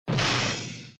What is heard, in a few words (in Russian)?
Звук удара после падения из мультика